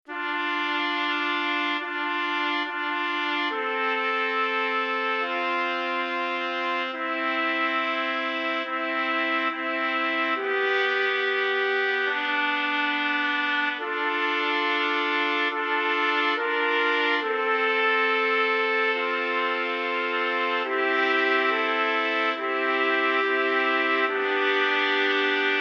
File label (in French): Trio de trompettes Débutant